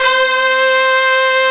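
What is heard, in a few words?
RICH BRASS C2 left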